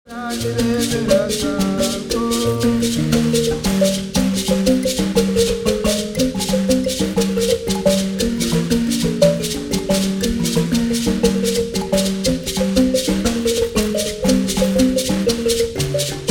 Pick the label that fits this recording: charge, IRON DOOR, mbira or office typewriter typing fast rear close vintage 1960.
mbira